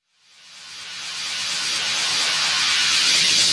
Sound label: FX Uplifter